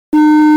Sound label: Sound Prohibit